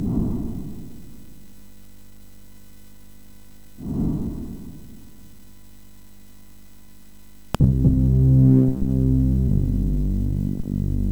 MOS6581

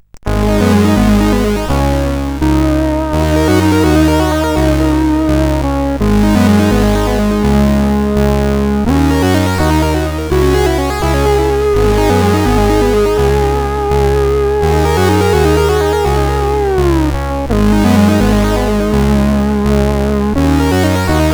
Soft Love, sid CSG8580R5

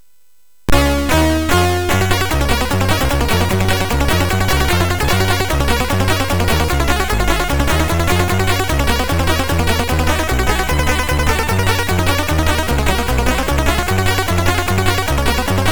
Music Demo Version, MOS6581R3; hvsc

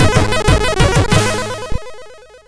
Game Muzak; sid MOS6581R2; Moon

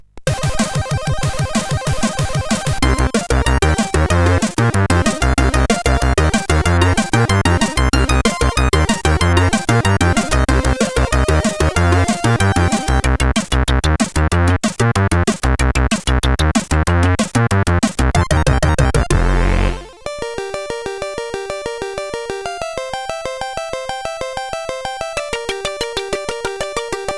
Acid Remix, CSG8580R5; Acid Runner

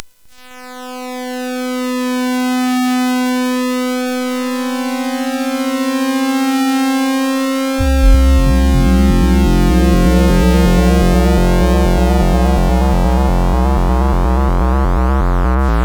Dream Injection end, CSG8580R5

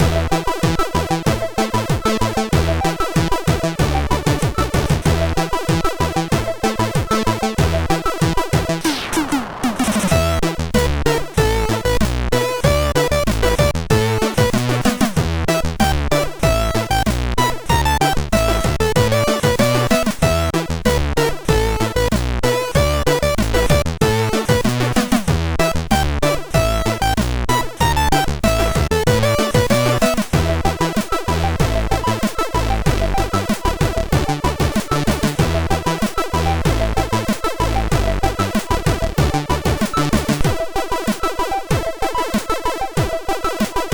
Disco Bass, MOS6581R3

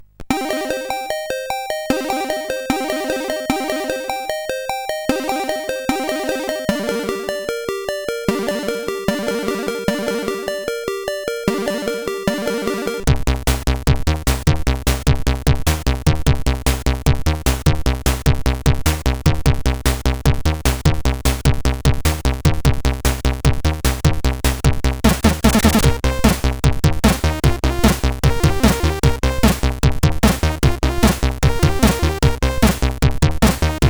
Improvisation, CSG8580R5

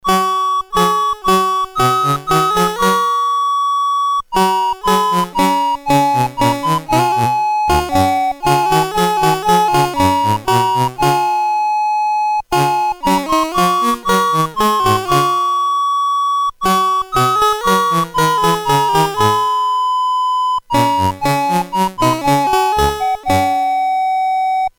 Musik, Commodore, CSG8580; CSG8580R5; M1 Musik BASIC

